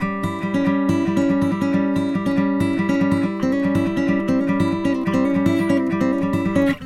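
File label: Country Guitar (Chorus Sec 1); Digidesign; Pro Tools Essential Loops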